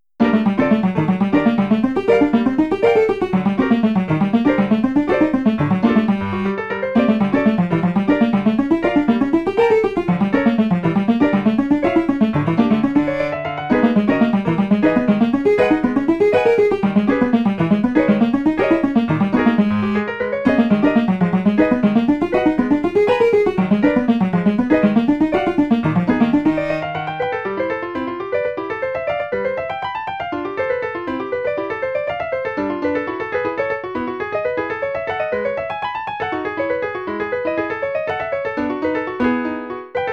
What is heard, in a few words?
Pipes and Mallets